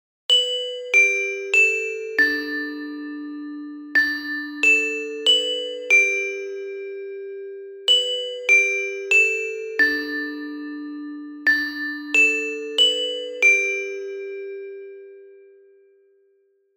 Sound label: bigben